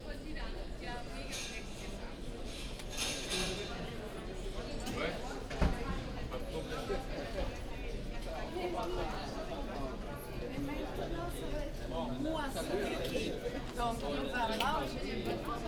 Urban Large Building Near Bridge INT Stereo Binaural